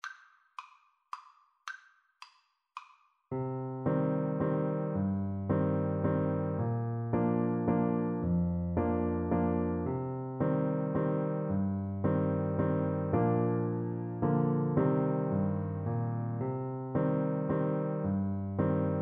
boat song, Trumpet

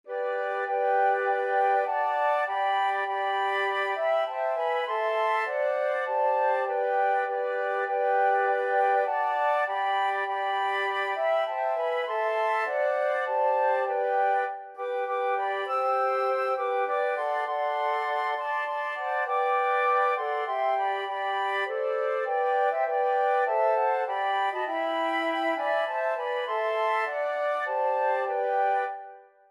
flute quartet

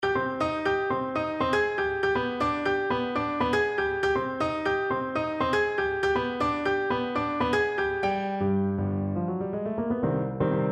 salsa, Clarinet

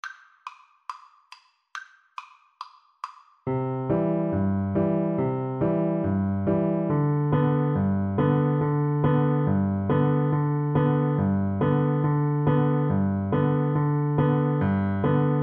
tom tom pipers son, Piano Duet

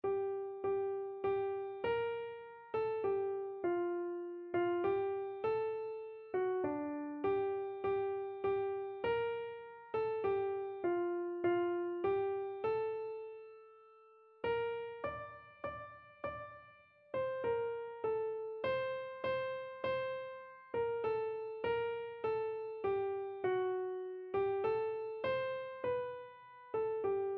remember o thou, keyboard

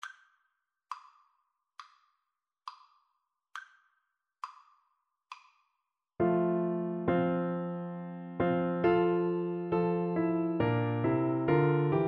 Navy Hymn BN, Bassoon

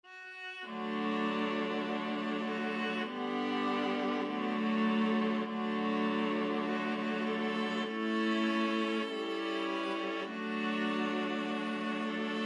viola quartet; swing low

